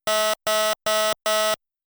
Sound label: Empty Warning Sound